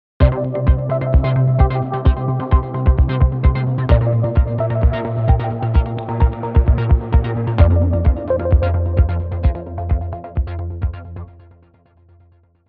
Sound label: stinger; musicfox